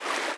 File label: fast water, river sounds